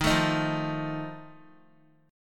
Eb Suspended 2nd Flat 5th Eb Guitar 4E 4A 5D 5G 5C 6F; Ebsus2b5 strummed; JGuitar